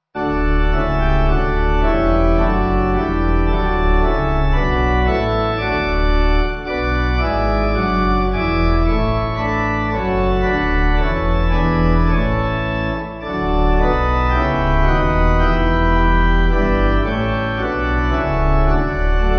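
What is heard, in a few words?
Pipe, Pipe Organ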